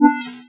signal; netstumbler

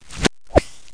CLEAR BOX

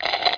paint select

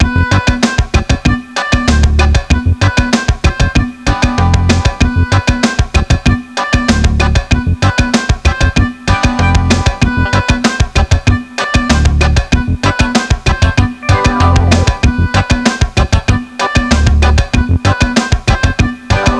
Reggae

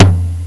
drum; Jammin' Keys

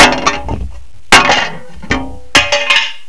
2 players crash; Macworld (1998-10); Games World; Shareware Games; Arcade; idin 2.0

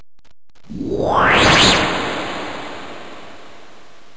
whoosh; My Animated Word Book

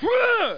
GRUNT; DECATHALON

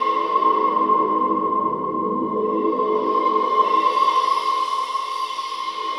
sphere, ambient, 160; eJay

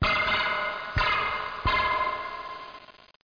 LITE BEAM; 10,000 Graphics Pack Volume 1; Media Graphics International

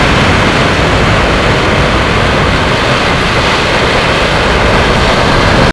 water; Far Gate

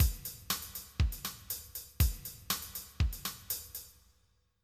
ballad; MacAddict; Voodoo 1.2.0 Demo; Blues

